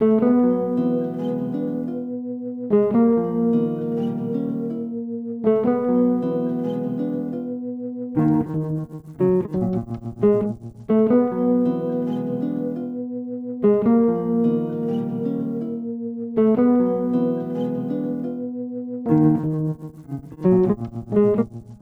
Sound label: Melody